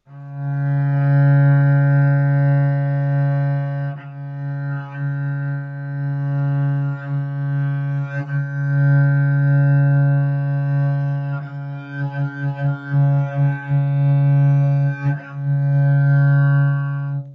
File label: wolftone